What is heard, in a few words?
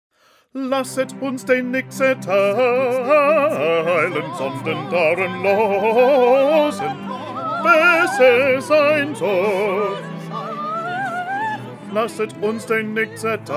Bass; Bach; St John Passion